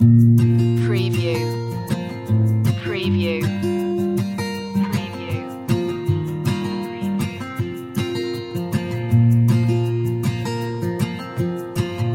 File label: folk indie acoustic loop